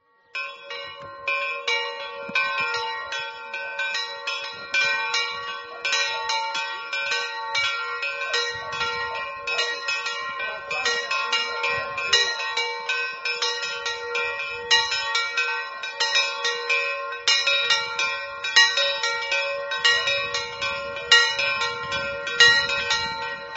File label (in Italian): casali, campane